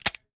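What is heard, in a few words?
Weapon Pistol 10mm Fire Dry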